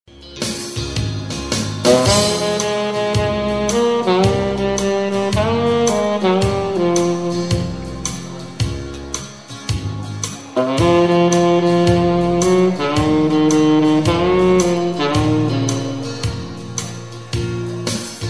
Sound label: soli sax